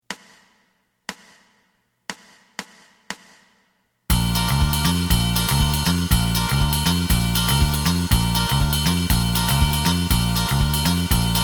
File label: lead